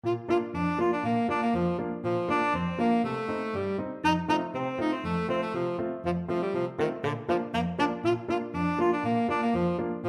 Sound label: tenor saxophone